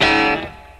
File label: blues, Guitar; Notes